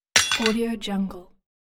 knife drop; chicasound

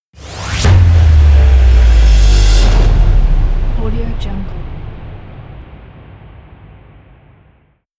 epic powerful logo

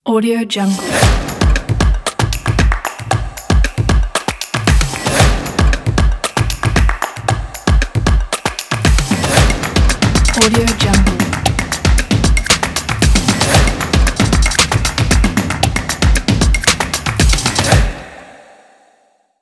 stomp intro ident

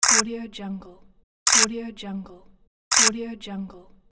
camera

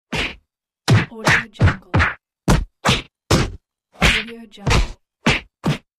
fighting punches

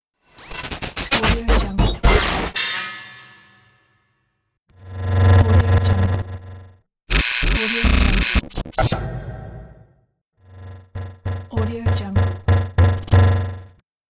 futuristic glitch transition; intro-boom

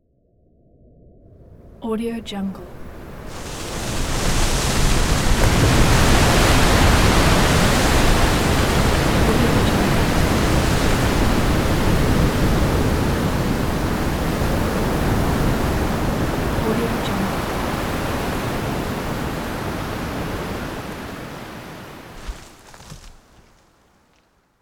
tsunami

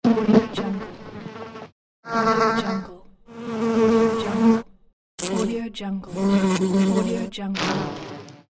fly buzzing

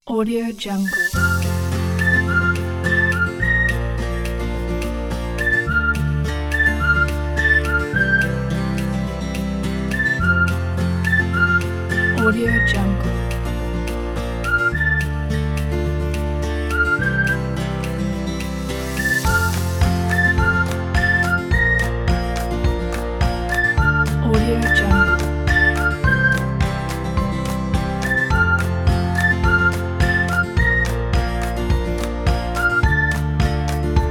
happy fun kids summer

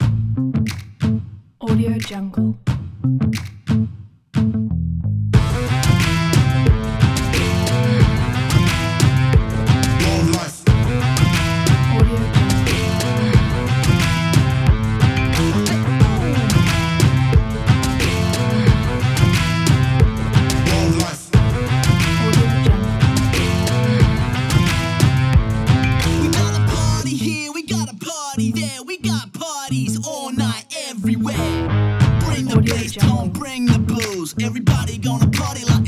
wildlife epic cinematic party hiphop

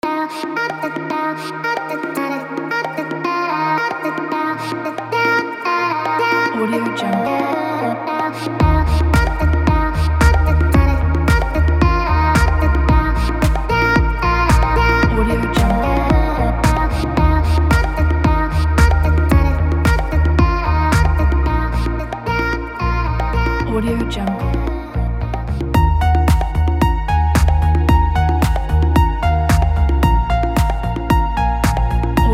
luxury lounge chill house; babakshah-music

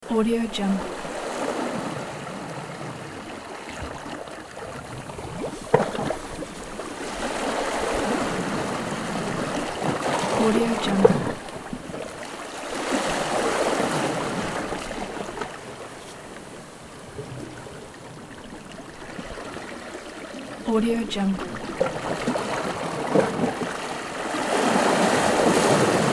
sea waves on a rocky beach; soundtrips

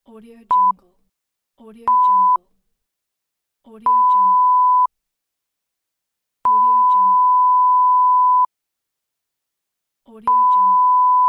censor beep

